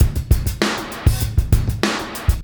fx, Beat Loops, 098; Attitude; Zero-G - Monster Beats

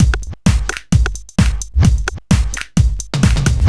Full, Drum Loops 130bpm; Zero-G; Soundclash Nu Dance Electro; Acidized Wavs; Nip Tuck

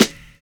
BUZZ SNAP; Univers Sons - Kitch 70s

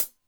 Cym Rock Tite, Drum Machines Alesis D4

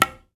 TABLA TONE

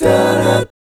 A13B5, GUY CHORDS, Vocal, Jazz; ILIO; Vocal Planet VOL-3; Jazz & FX

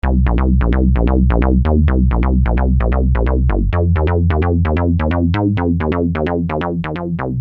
Syn-Bass m Cm, Techno Synth Loops, 130bpm; Sonic Foundry (Sony Creative Software); Signals I

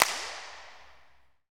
PRC RVB C, Clap; Rhythm Section; Clap & Snap; Clap Modules

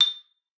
A6, xylophone; Xsample VOL-4

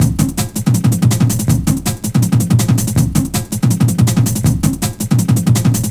162, breaks, Loops; M8

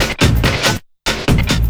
LOOP SD, Drum & Bass, 140 BPM

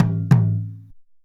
JUNJUNBA HIT; Best Service; World Colours

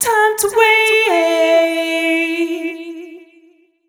f 132 mel, Vocals, fem

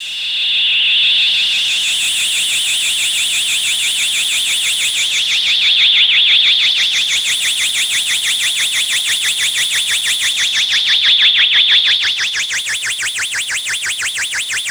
INSECT, Special FX Twisted; USB Soundscan; AKAI

